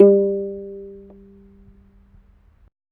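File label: African HARP G 4; AFR. HARP1; Heart of Africa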